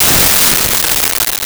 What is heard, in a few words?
Bat Ram, Weapons and Mass Destruction